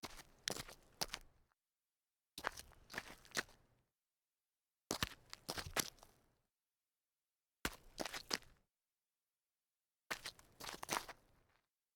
turnaround on light grit

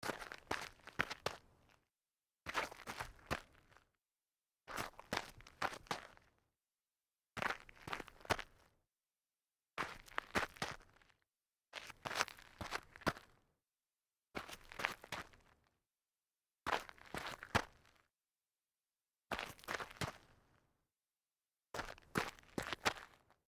sneaker turnaround light grit, foley

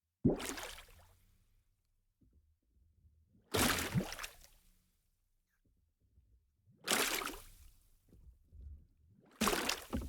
large bubbles surfacing

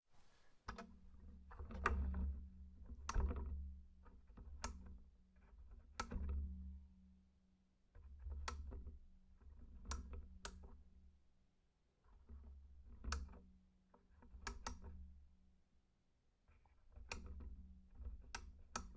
lamp switch on off

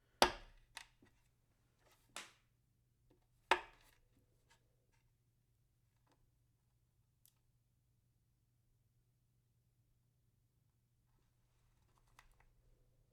crushing pills

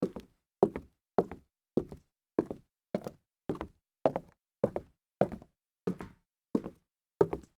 walk on solid wood, foley

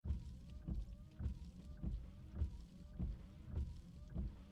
fast windshield wipers

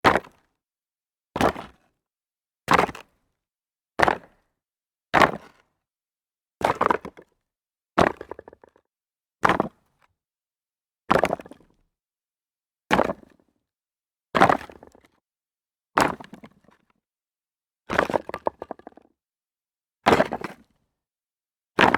jump on rocks

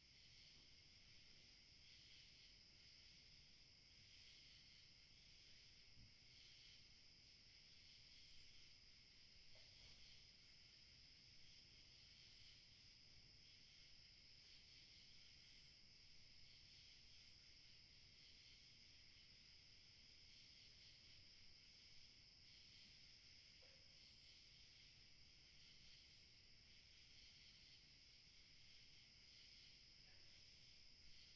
july night

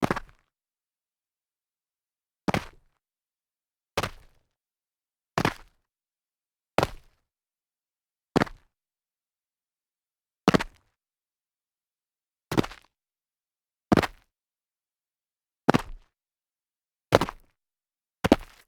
sneaker medium jump light grit

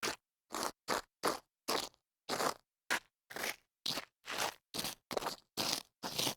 scuff grit with heels

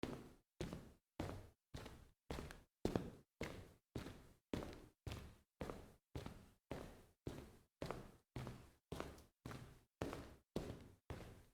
walking fast on concrete

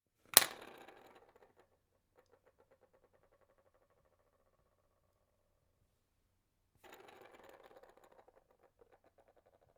wood pencil drop roll wobble

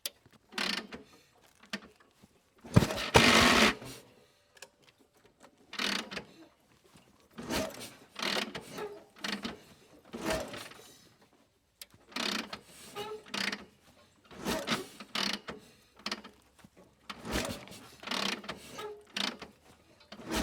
gear shift and motor start

